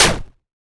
Stomp Short

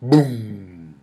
boom

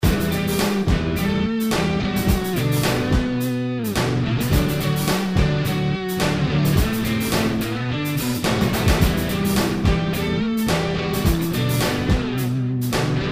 rock Guitar

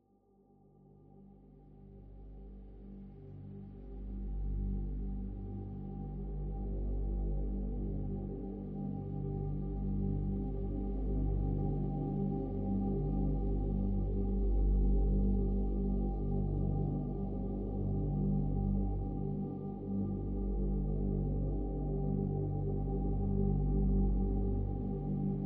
bg loop; thetascape

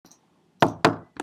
knock

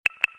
beep

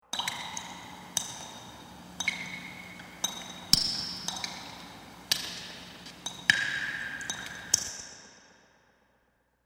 prison goute

